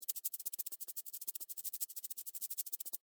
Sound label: Beetle Run Sand, Animal, Beetle, Run, Sand, Scurry, Insect, Bug; BlastWave